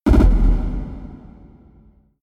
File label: impact